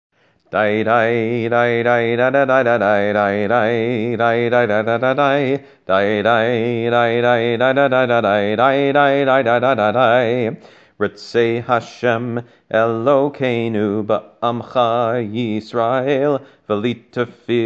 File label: melody; Tehillat Hashem Nusach Ha-Ari Zal Siddur